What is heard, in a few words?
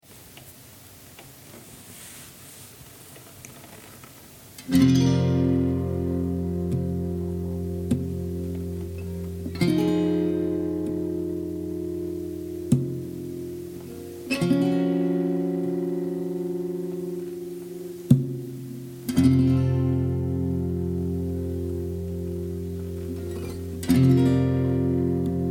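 F 100 guitar chords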